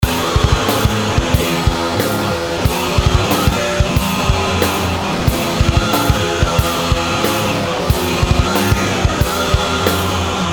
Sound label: guitar flunker loop